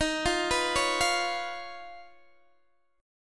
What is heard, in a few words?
Eb 7th Suspended 2nd Sharp 5th Eb, Guitar Open D; down